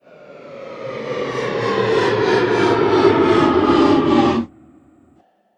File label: Spaceship engines slow down